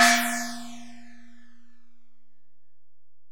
Ride, Alesis DM-PRO

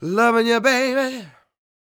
SING, Vocal Planet Blues Soul BLUESY

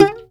SHUR NOTE, TOPSHUR NT

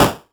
Elektron Monomachine, Drum Machines

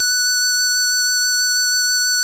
VOX F#5, VOX CONTINTL, Vintage Keyboards; Big Fish Audio; First Call Vintage Keyboards

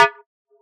mod hit, Mod Drums; neuro; Sinistarr

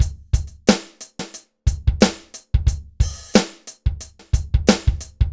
dry Loop BPM 90 Dry, AKAI S6000

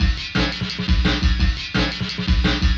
Flange FX, Loops, breaks; M8

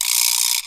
VIBRA, ASIAN PERCS; Crypton - DrumBase5000

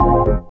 ORGAN A

